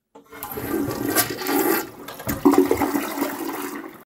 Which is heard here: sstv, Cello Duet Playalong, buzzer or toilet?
toilet